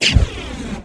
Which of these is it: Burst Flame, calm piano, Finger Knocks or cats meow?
Burst Flame